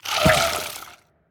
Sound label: spitter death; creatures